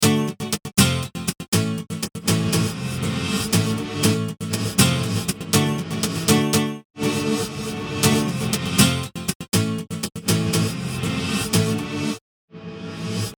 acoustic reverb reverse

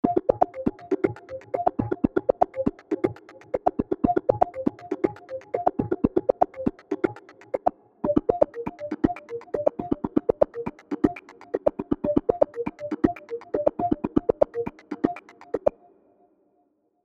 16th beat; Flux

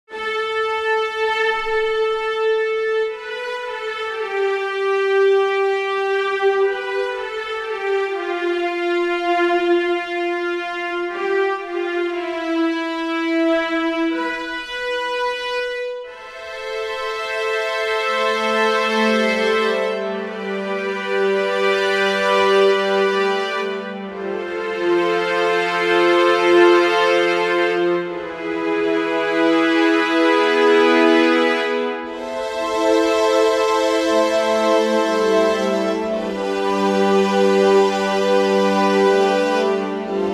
Violas

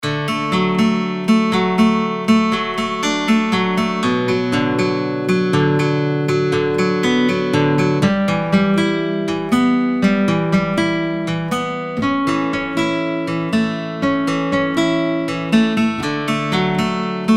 6 arpeggio

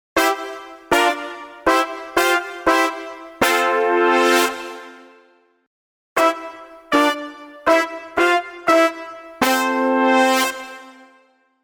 poly smartvoice

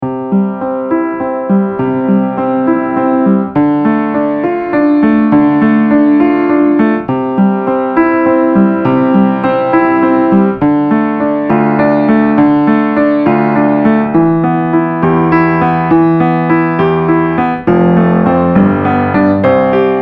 cinematic closed

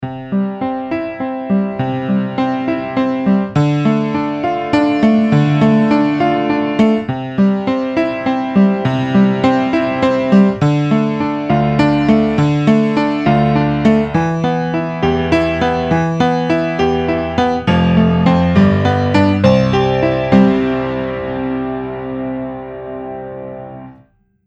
pure upright open

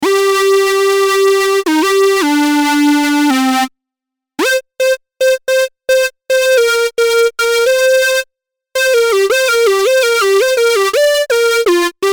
Solo

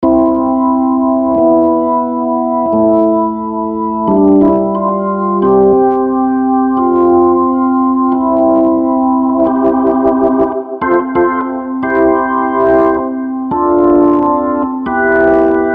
Ballad clean

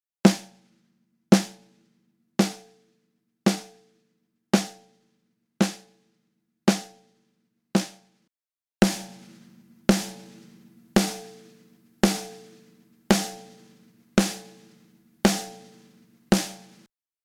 snare un and compressed

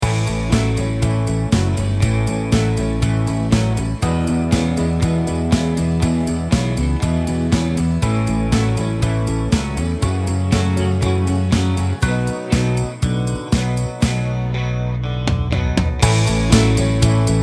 Snare Go Crack; no Elevate

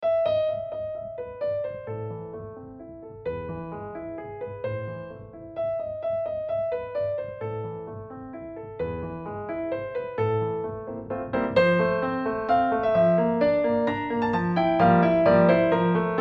Bechstein Altiverb

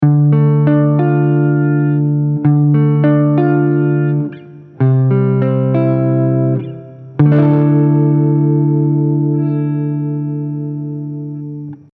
clean custom Champ

